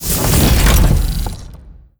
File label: move approach hop down, dog; npc; scripted